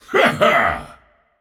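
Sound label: non verbal yay, voice; cernunnos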